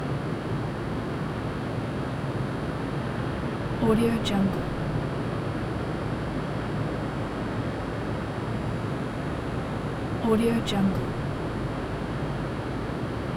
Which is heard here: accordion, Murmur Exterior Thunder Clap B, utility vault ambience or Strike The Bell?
utility vault ambience